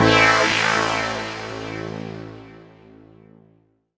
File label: boss lose